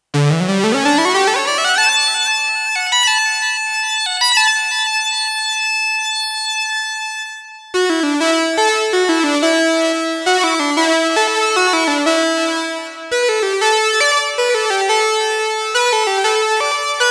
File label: OB-1 2 pole Sync Lead